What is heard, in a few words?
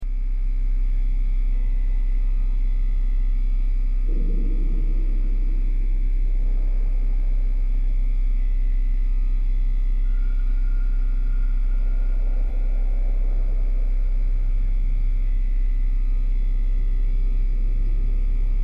Backrooms Ambience Sound Effect